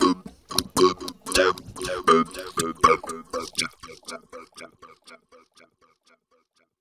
BEAT FX, Funky Vocals; USB Soundscan vol.18; AKAI; TB BEAT

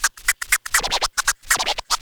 FX scratch

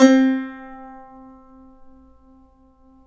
SAZ; Roland - LA Composer vol. 3

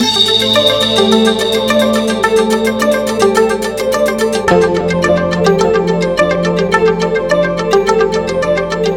Arp, Keyboards

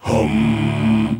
Male Voice, African Voices

